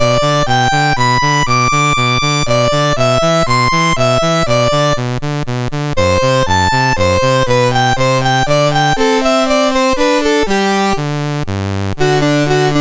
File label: Allegro, MOS6581R2